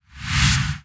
multiplier Zoom In; netent; starburst xxxtreme

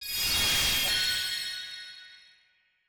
scatter FS Landed; netent

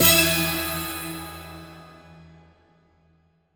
tinybell; netent

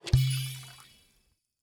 spin button click; netent

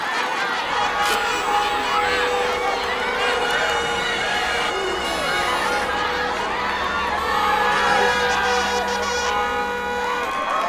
celebration; netent

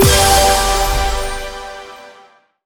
Win blink; netent

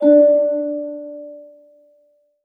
melody D5; netent

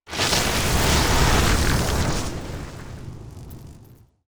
Heaven Wild Collect; netent

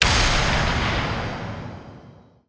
big win mega attention

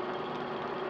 reel free spin; netent

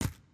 reel Bounce; netent

